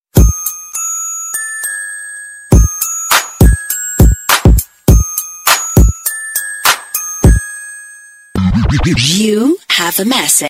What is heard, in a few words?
Blackberry Sms